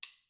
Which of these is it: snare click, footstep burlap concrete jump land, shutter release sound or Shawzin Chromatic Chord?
snare click